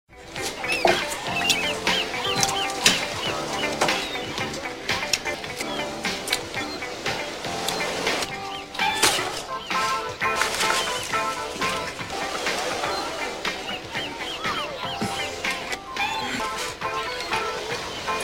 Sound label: bar music